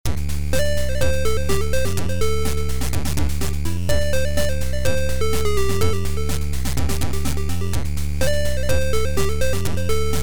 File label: bell Chickens Strut